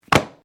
Book Drop; Book movement; Books; Offices; SoundEffects-Foundation1

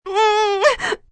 Pain Scared, Pain, agony, female, short

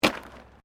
JUNK, Debris

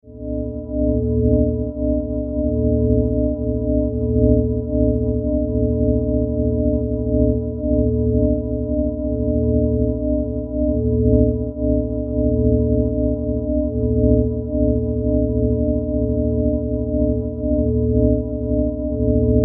Science Fiction Magnetic Field Drone; Sci-Fi; SFX Bible

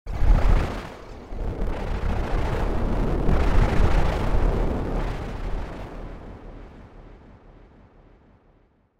Meteor Storm, science fiction sound effect

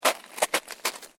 SNOW CREAK

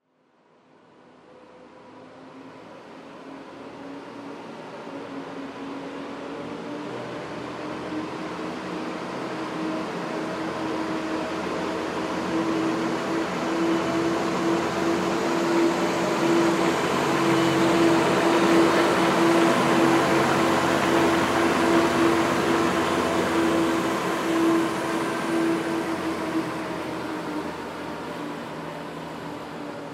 Zamboni Pass By, Zamboni Pass By Right To Left; Miscellaneous Sports

